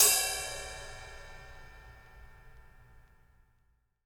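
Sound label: ride edge, drum kits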